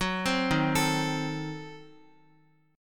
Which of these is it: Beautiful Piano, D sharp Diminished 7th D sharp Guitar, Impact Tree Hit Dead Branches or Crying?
D sharp Diminished 7th D sharp Guitar